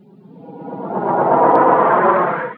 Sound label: swoop, Percussion, Swish